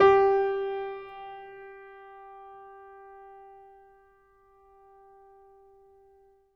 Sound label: MIX R, Acoustic Piano, PIANO STEREO; InVision Interactive; Miroslav Vitous